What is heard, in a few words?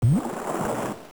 sploosh